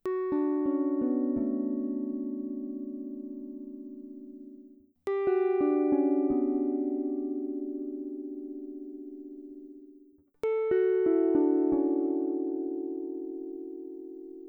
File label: g major 7 b5 clusters